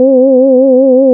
LEAD, Nord; Wizoo - Powered Nord; LEAD PAD HRN

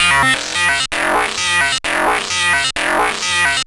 MiniBrute Loop, 130BPM; musicradar; bitcrushed; Crushed Loops

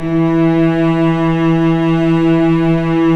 STR VC3 mf

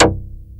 TURBO ACID

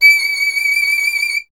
STR VLN, Vln Detache; Miroslav Vitous

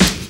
SD HOU, Dance Mega Drums; Best Service

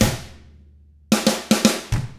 110 SMBA samba; ILIO; Double Platinum Drums 1

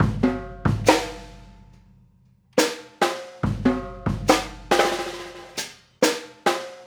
Trap Kit, Drums; Sonic Foundry (Sony Creative Software) - Essential Percussion

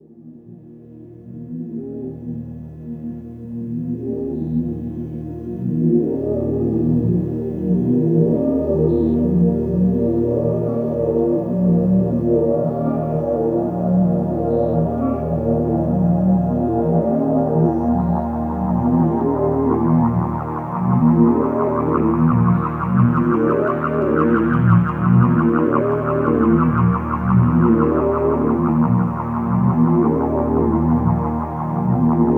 Low Long Complex, Complex Wave; Sonic Foundry (Sony Creative Software) - James Johnson Spektral Minimalism

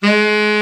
BRA TENOR, SAX; Best Service Gigapack 1; BR SAX 4

